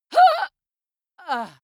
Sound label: female die; schreie; menschen